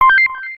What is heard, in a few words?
menu hit